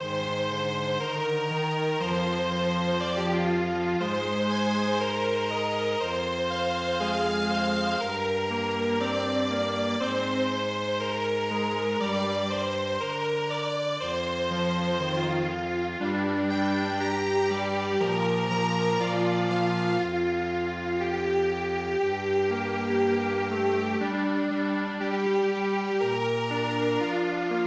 Strings Quartet; Wuzhishan ge

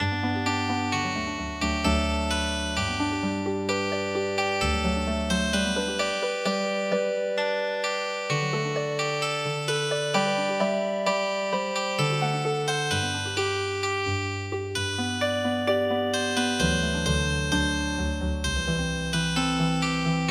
Healing Harp, traditional; Duncan Gray

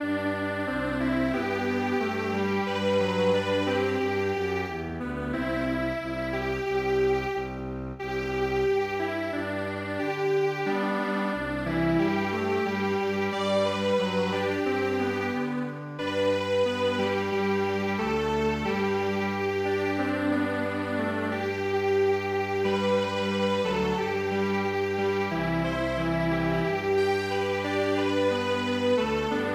Strings Quartet; midi; traditional

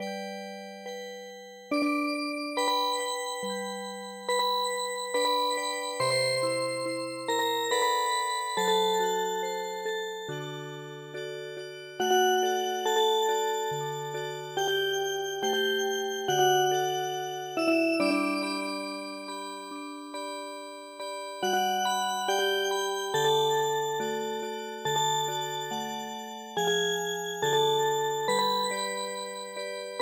Chilean Music Box; midi; traditional

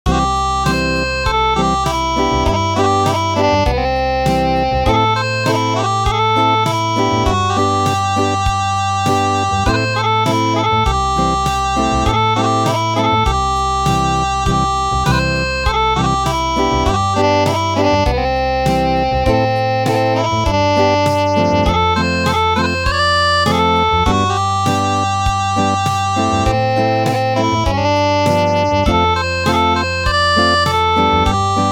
Acoustic, traditional; The Melody of Purple Bamboo

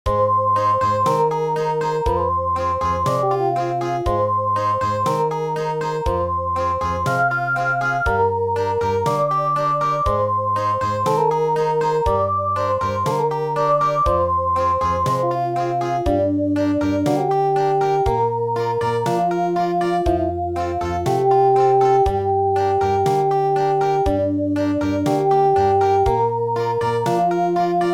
Country; Wuzhishan ge

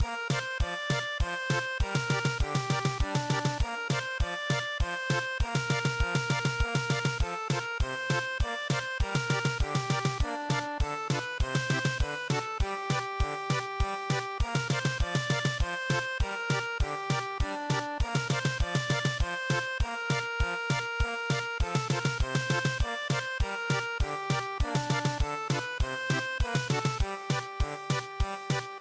German Polka, midi traditional; Walsingham

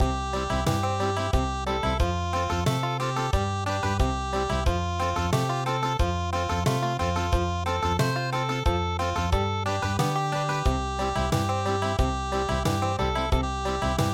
Country

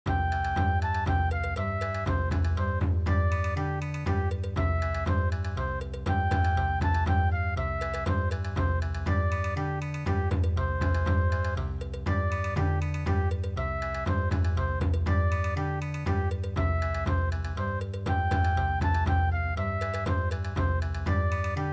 Bon Odori, midi; Ach Du Lieber Augustin

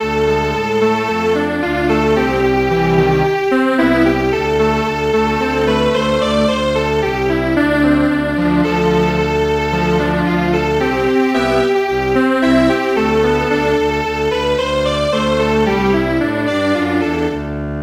Strings Quartet, traditional; Drunken Sailor

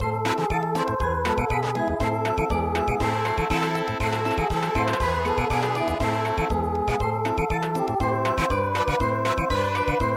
Samba

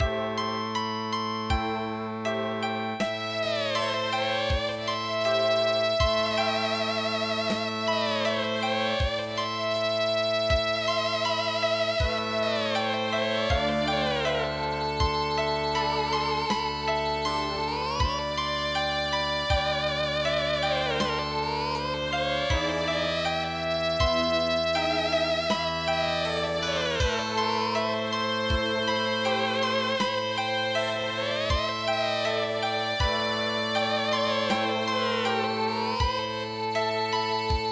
Luoshui tian Erhu Music

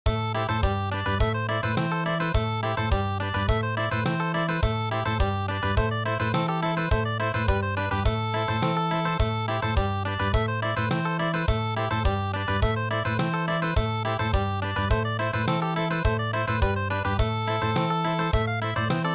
Country

